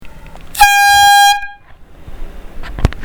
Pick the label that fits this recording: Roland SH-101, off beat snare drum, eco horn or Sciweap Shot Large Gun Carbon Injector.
eco horn